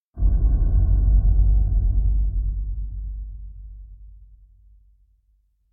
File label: Impact Dull Sub Metal Hit (Deep Distant Bell); Hollywood Edge; sound designer's toolkit v2